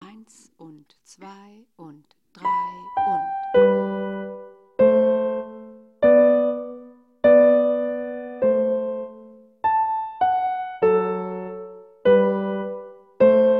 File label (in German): menuett in g dur, Piano Klassik; Play Piano Klassik